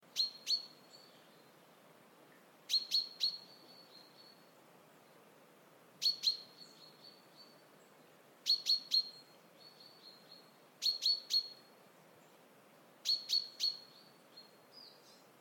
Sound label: pipping chaffinch; covepark